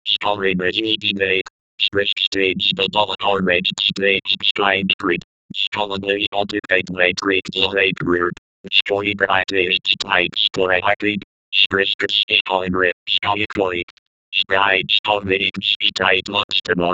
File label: an old security robot, robot